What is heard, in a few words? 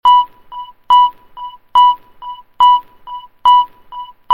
Low Siren Sms